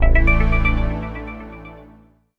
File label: Pulsing Logo Accent